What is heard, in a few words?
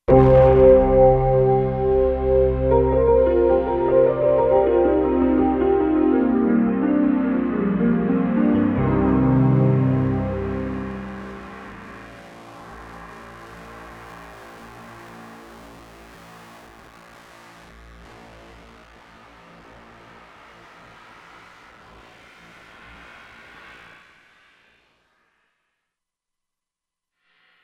Fallout, Kurzweil K2500X